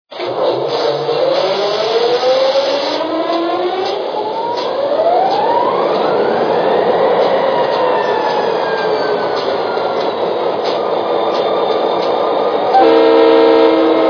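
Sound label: GP40, locomotive; NYC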